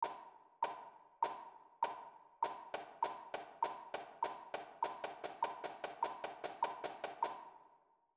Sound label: Drumline Marching Percussion Metronome